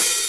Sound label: HH, HIHAT HIP; Best Service Dance Mega Drums